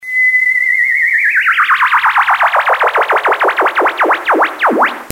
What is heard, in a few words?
Zap